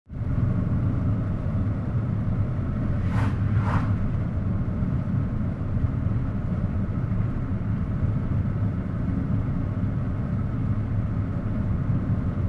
car inside with traffic

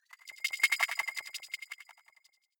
sci-fi subterranean light up high

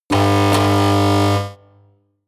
buzz

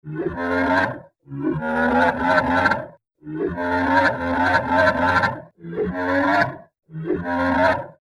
creature

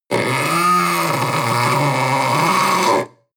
panther young roar long

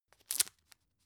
tissue open

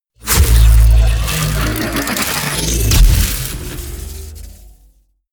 wind up

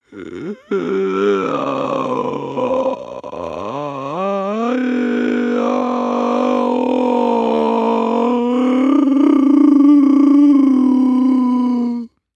breath male inhale whine

